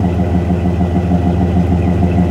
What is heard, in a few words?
Dodge Ram Idle